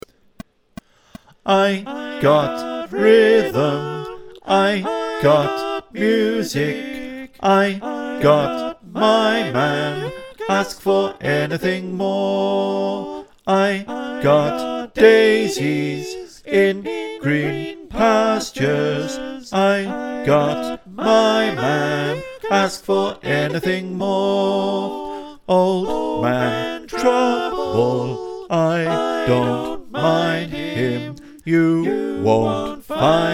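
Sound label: bass, choir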